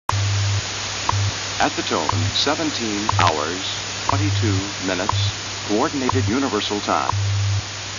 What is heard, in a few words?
distorted hours